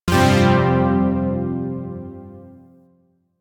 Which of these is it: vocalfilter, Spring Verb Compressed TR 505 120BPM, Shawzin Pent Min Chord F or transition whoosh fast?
Shawzin Pent Min Chord F